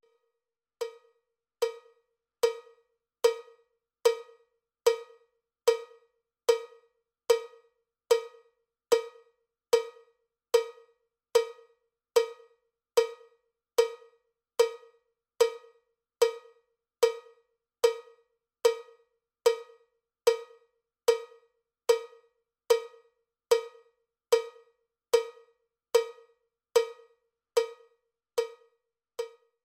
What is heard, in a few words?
cowbell click track bpm 79; drumtex; cowbell click tracks vol 1